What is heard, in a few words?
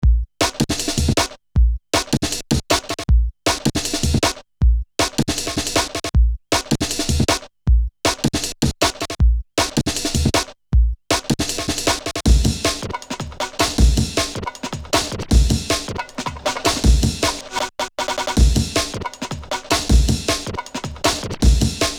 breaks, Jungle; Zero-G Jungle Frenzy 1